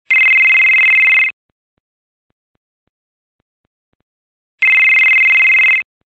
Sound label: Nextel Moto Ringer